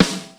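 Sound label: snare power, Drum Machines, Yamaha Motif ES-Series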